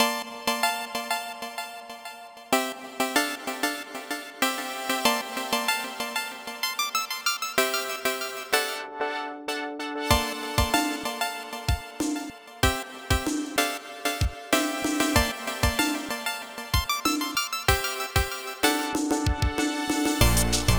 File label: Sam's Band (Electronic Version); stardew valley original soundtrack 2018